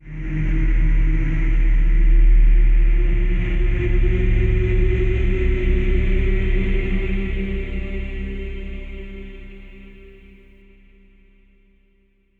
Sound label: VFX Atmos